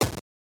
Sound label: 8-Bit Gun Shot; SoundsCrate